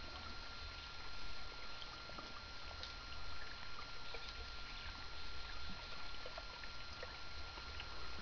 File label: bird dolphin